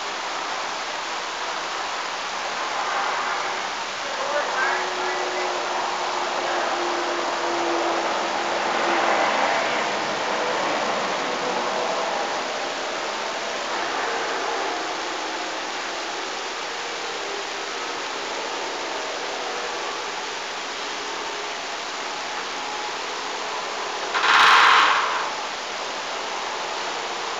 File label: voices and then a loud creak; The Old Place